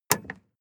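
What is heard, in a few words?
sports car exterior bonnet open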